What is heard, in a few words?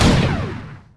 bullet blastcan; 星际争霸音效; 星际争霸其他